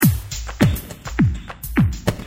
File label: tribal, rythm loops